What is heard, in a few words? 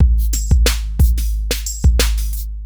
Smooth, R&B Drums